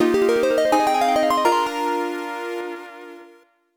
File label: Harp; Brandish VT; hoot; PC-98; Requiem